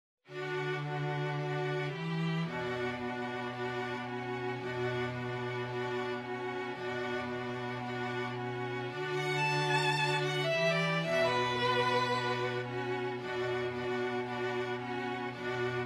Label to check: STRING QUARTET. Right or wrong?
Right